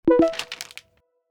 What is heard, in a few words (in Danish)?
SMS Sendt